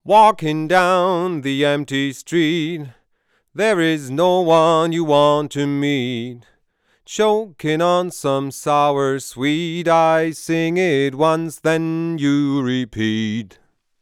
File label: Summit Audio TPA 200B Vocal Light Overdrive